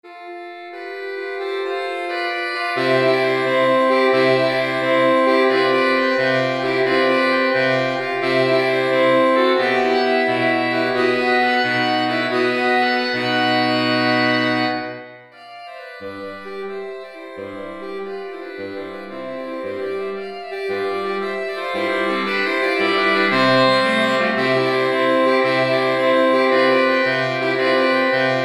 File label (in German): saxophonquintett